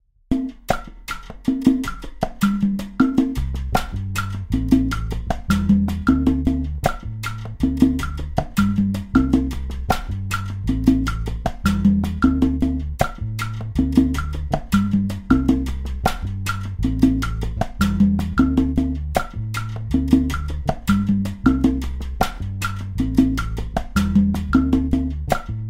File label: Guaracha